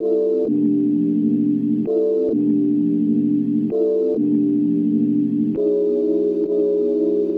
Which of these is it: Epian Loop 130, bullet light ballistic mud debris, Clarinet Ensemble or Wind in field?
Epian Loop 130